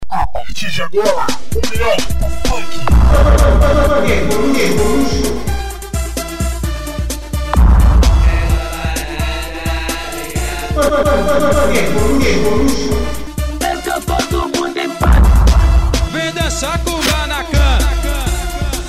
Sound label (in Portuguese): abertura funk; evolution